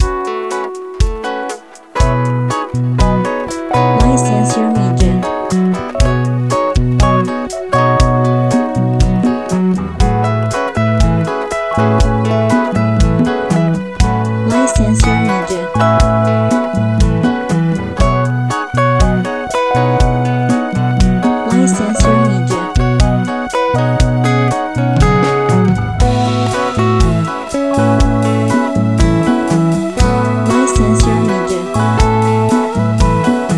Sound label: Bossa